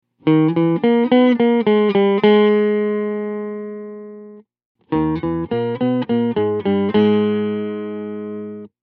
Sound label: Pitch Transposer Octave